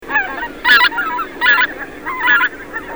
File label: Anser albifrons